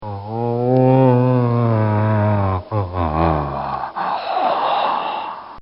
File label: Demented Man Howling